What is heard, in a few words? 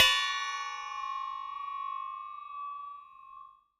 Metal Teapot Stand Strike